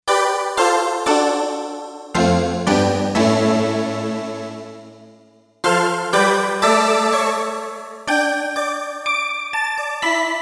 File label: Brightness, Roland SC-880; Factory Tones